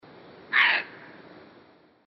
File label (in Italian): Volpe rossa